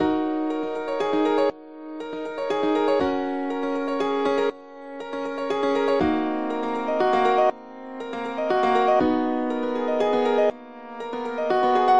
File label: aqua chill piano loop for dope beats or songs; looperman